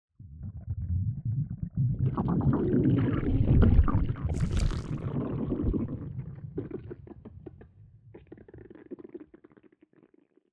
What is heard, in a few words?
death